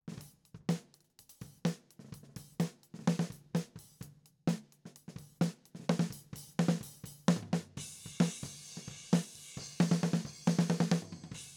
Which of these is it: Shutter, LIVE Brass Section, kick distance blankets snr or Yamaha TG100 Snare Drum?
kick distance blankets snr